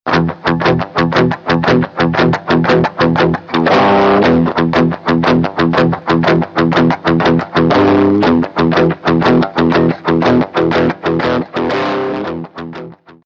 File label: hiwatt C50 bright; amps